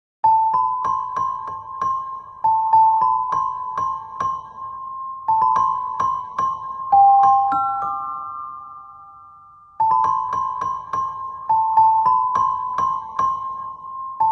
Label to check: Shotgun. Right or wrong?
Wrong